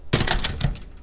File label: door latch